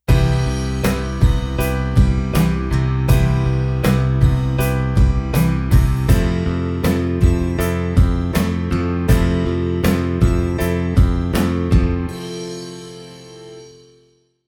cmaj7 fmaj7

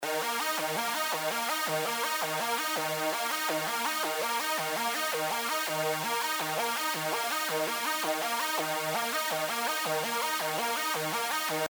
Synth

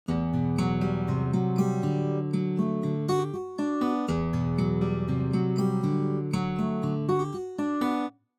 Picked Arp

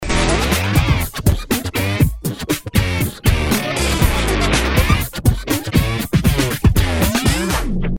pitch down; cubase

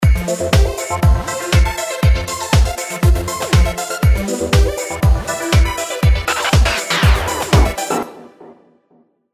scratch synth

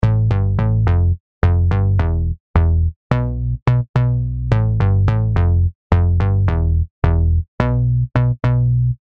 Bass Dry

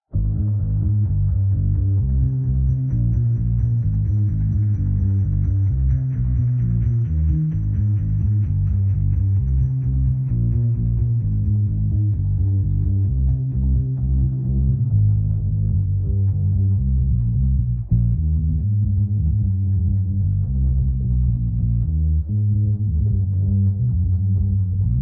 bass; RX10

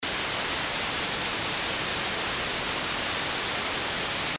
white noise

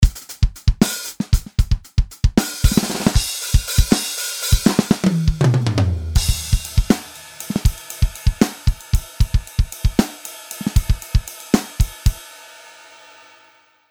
Dist on

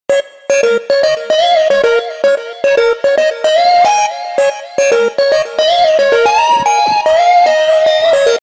Synth

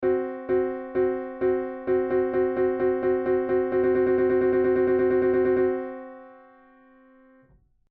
tri tone

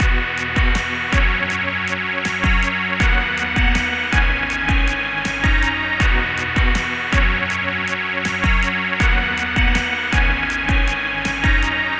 Backing

